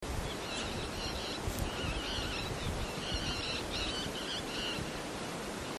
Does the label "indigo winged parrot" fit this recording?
Yes